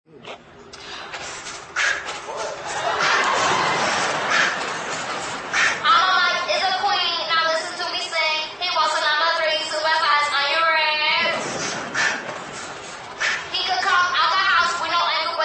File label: rap